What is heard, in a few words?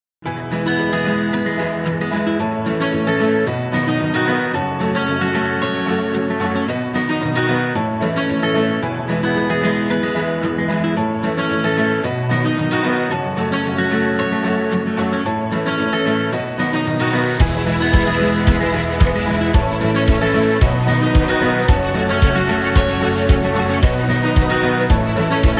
Holiday Happy, happy music; happy music vol 9